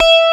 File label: E4 TELECASTR, Bass Guitar; AKAI S6000 CD-ROM - Volume 1